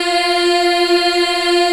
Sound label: F3 child eh, Choir; USB Soundscan vol.28